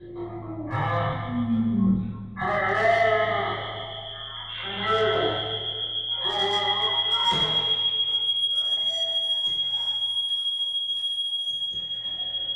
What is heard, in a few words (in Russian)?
zvuki ishodyashie iz golovi cheloveka kotorogo pitayut. eti zvuki ego kriki